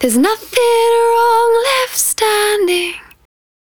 110bpm Ab, Velvet Vocal; Zero-G

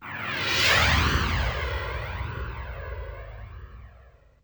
Whoosh; BERZARRESOUNDZ

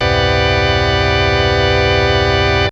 vox, dx7; voxfarf3c

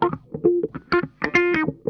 NOTE, Funk Guitar; Zero G - Funk Guitar

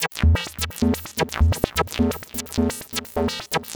Lead

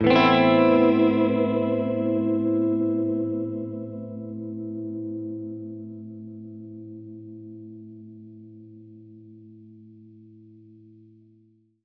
Gretsch Chord A min add9, Guitar Chords

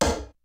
kicks snare, kid; Kid Nepro; Elite; KICKS SNARES

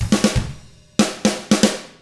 ROCK

